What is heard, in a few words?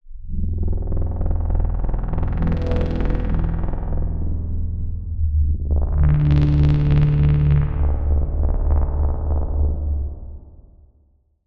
SFX, Sylenth1; SFX - Nuclear Power Plant; Routed Sound; Sylenth1 Expansion - Quantum Enforcer Vol.1